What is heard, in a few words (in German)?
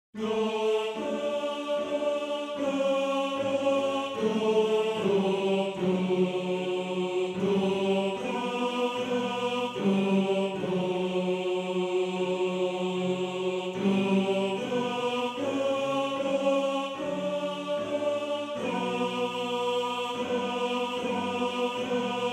Tenor; Tenor 2